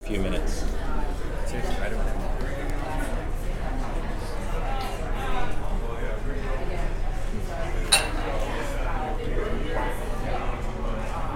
Interior Walla Cafe Many Voices Faint Music

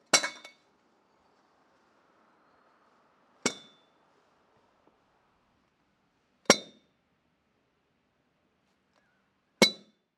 Knocking Steel Stick Against Steel

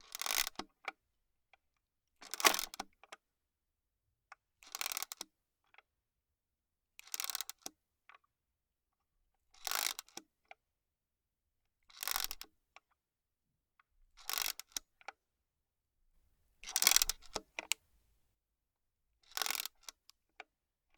Mechanics Wind And Play

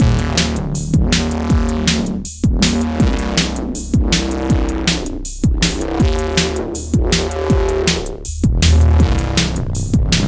Growl Bass

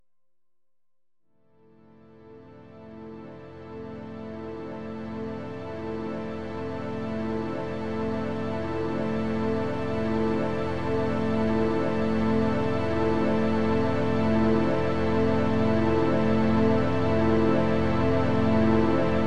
Strings Aminor

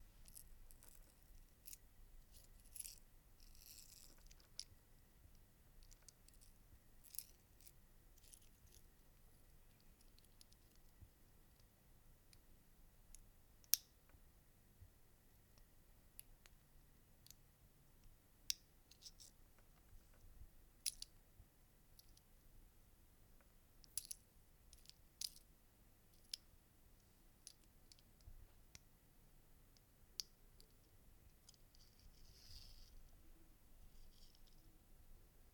Necklace And Locket

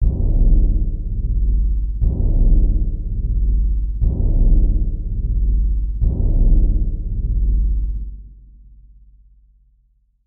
Cinematic Leadin

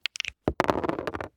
Dice Gambling

